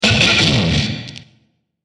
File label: Machine Breaking Down, machinery sound effect